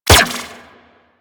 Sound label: Laser Fire; Riot Moa